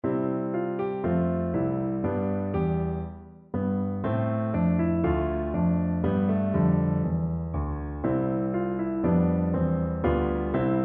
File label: Alto Recorder